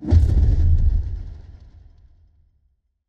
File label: impact deep; The Swapper